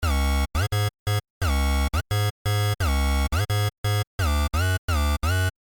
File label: Bassline; composed from 2 square waves and a triangular wave set at different frequencies and pan settings